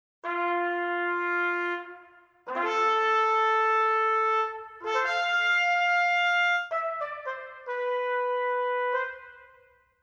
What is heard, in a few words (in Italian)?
tromba